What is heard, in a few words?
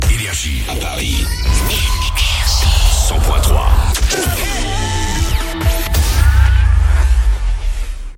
JINGLE; PARIS, 100.3 FM; NRJ